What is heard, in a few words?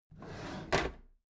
packed drawer smooth clatter open regular halfway